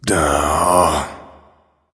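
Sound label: non-verbal sigh; smite; voicepack; houyi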